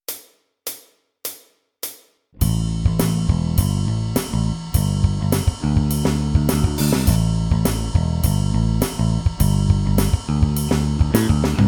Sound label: chord change; fretjam